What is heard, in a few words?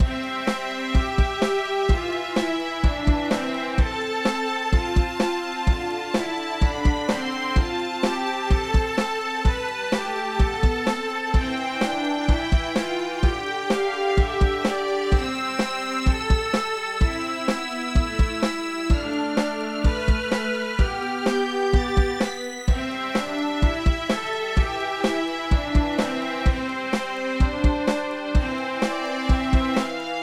violin